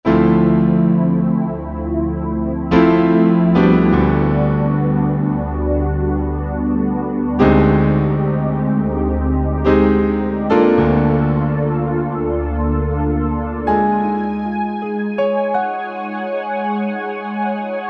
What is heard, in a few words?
Ac Piano + Pad, Roland JV-2080